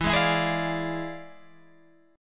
E 7th Flat 9th Guitar Open G, chord

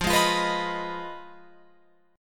F Minor 7th Flat 5th, Guitar, Open F

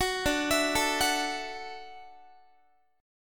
D-Major Add 9th D down Guitar; Dadd9; arpeggiated; 21,22,19,19,22,x

